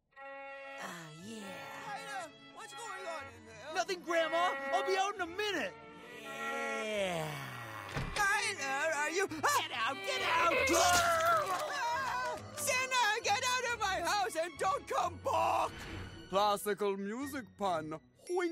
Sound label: violin